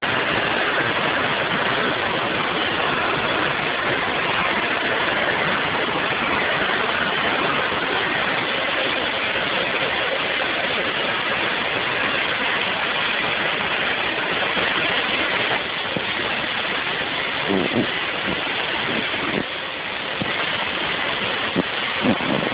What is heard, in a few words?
cutting